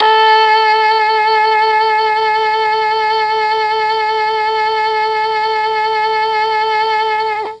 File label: A3 MKII SAX, Mellotron; Mike Pinder - Mellotron Archive CD